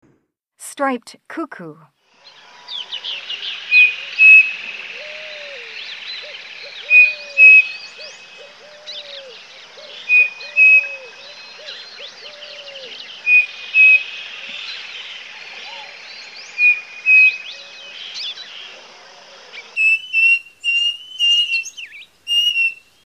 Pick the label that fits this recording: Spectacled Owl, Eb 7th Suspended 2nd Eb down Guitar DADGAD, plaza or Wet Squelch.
Spectacled Owl